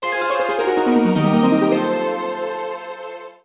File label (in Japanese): ジングル：詩人